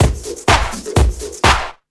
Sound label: drm 125, drumloops; PS-28 Vocal House Samples; inthemix